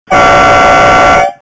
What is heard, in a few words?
airhorn